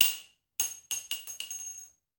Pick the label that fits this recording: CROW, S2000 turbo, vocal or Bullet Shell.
Bullet Shell